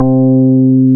BAS MKS-50, Roland

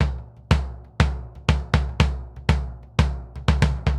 BOMBO, DRUMS SAMBA; Best Service ProSamples vol.14; AKAI

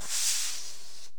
Korg x5, Drums

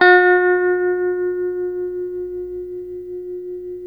TELE TREM G, Guitars, TREMO; Spectrasonics; Hans Zimmer Guitars VOL-1; TREMO-LUXURY

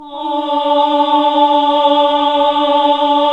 HAL AAH, Nuns Aahhh; Sound & Vision - Gigapack II CD 1 (Roland); HAL NUNS AAH OOH

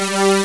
The Jungle, SNES; Corn Buster

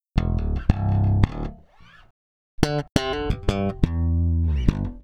Electric Bass; Sonic Foundry (Sony Creative Software); Hydroponic Hip-Hop

